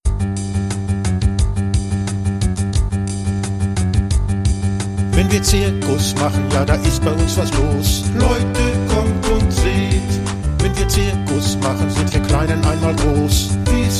zirkuslieder zirkus rap

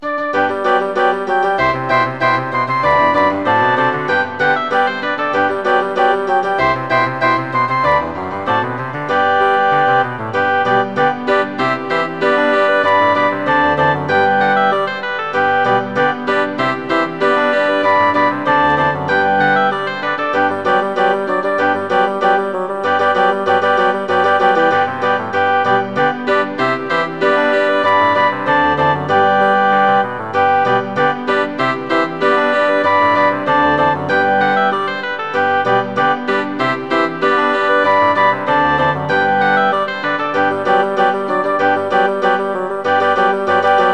zirkuslieder playback

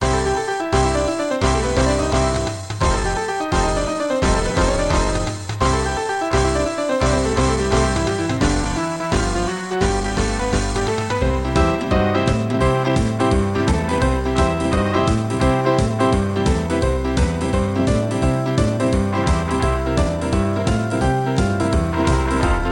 kinderlieder playback

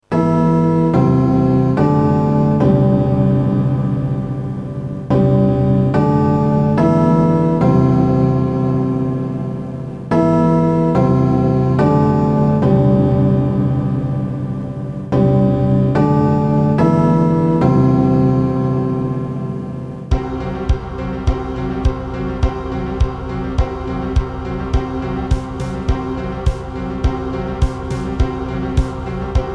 zirkuslieder playback

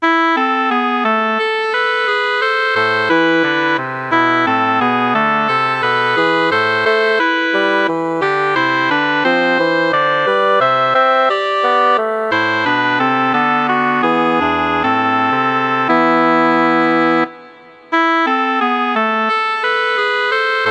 es sass ein schneeweiss voegelein holzblastrio

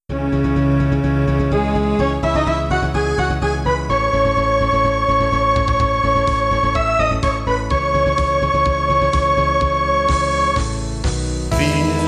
kinderlieder

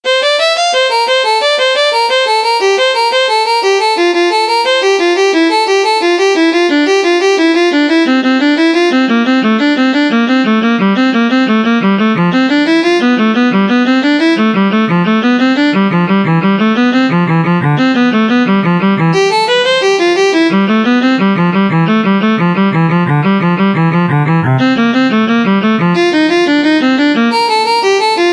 etueden nach js bach viola

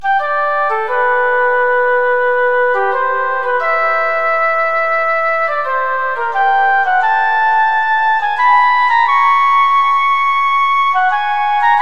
sonate fuer floete solo; 1 satz

